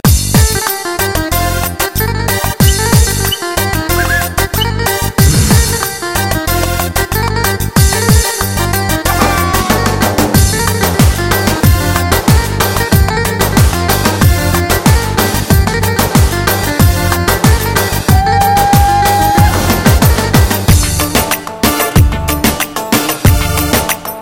ankara misket, bk5

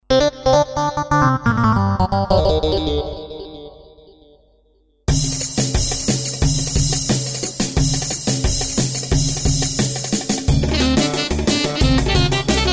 gw8 cft ritim

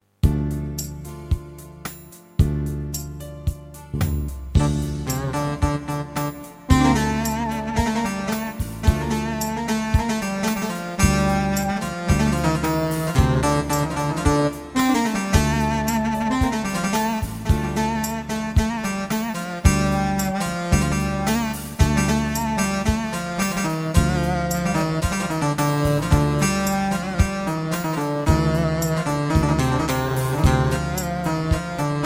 türkü grup, gem wk8

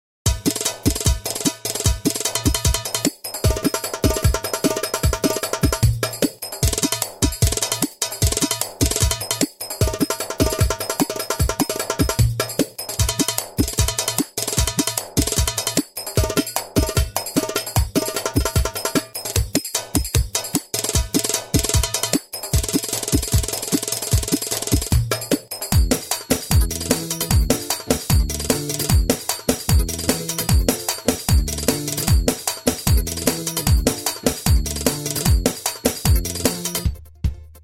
oryantel solo, pa800; marakachina pa800 set

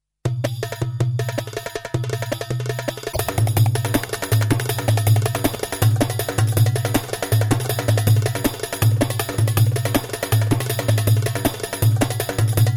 darbuka, ciftetelli; korg pa500 tr

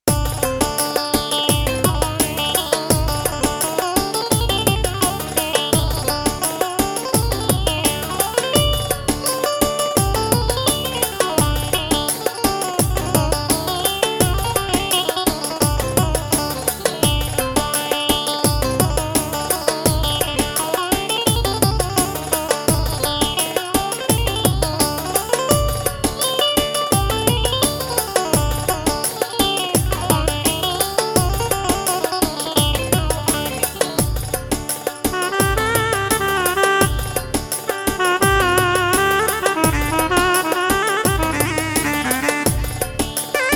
eskisehir ciftetelli, korg pa 500; tr orjinal

